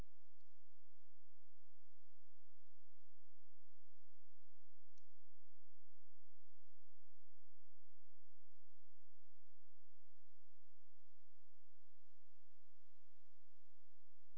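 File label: hydrophone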